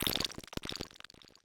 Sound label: Juicy-Glitch